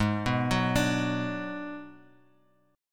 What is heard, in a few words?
Ab Minor 7th Flat 5th, Bass Standard; arpeggiated; JGuitar